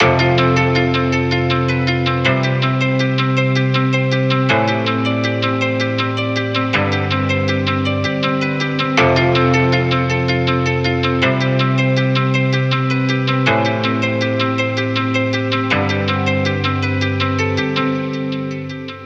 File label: Triplets Arp 107BPM, piano; nikos ultimate midi pack